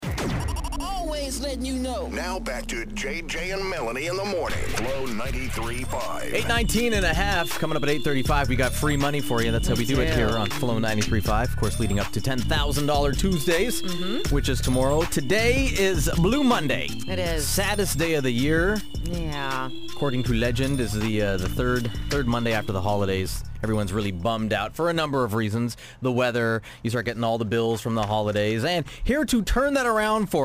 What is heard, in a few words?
Laughter